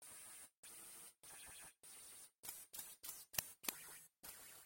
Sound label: Game Over Tune; Cool Spot; Genesis